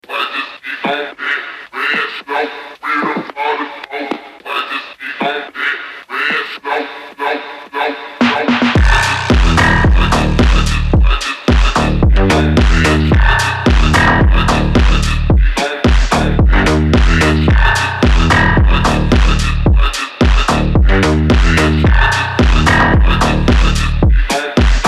phonk

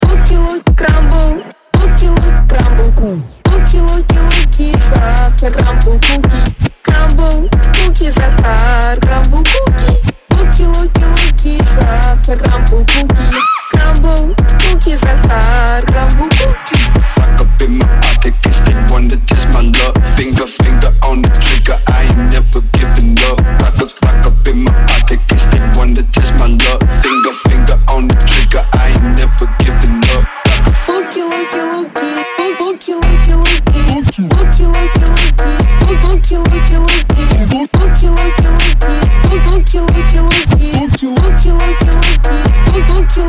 phonk slowed

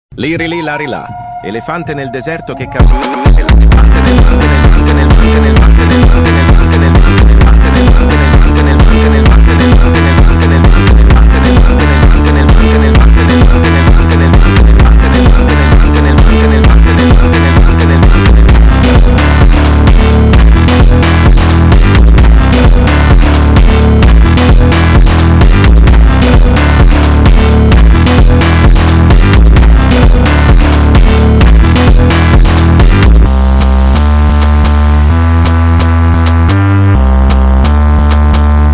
phonk groove; lirili larila

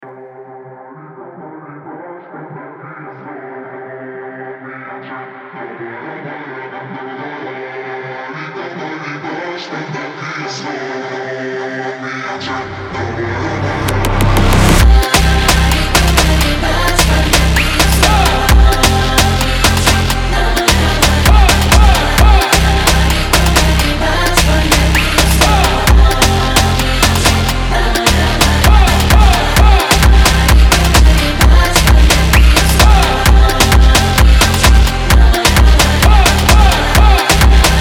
bulgarian funk

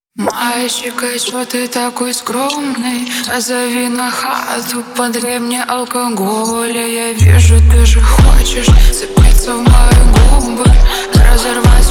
phonk slowed

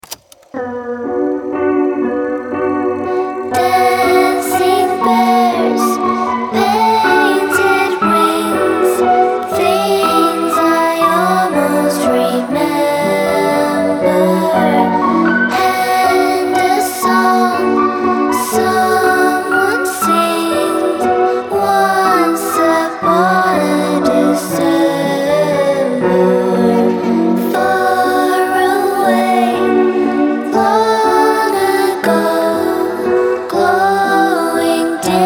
piano

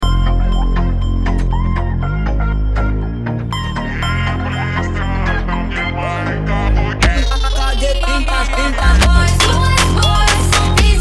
phonk

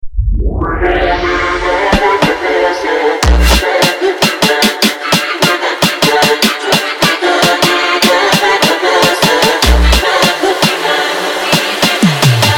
bulgarian ultraphunk

